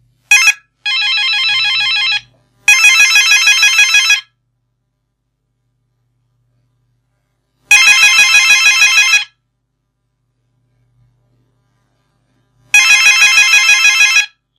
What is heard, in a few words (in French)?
sonnerie telephone moderne